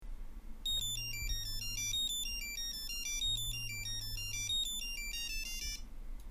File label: ringtone